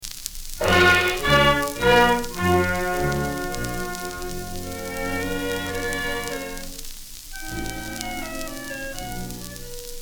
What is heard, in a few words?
Polyphon